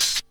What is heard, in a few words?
KIT, Dance Mega Drums; Best Service